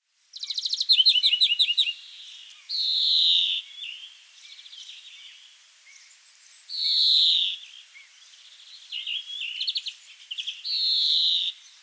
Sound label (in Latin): Chloris chloris; SETE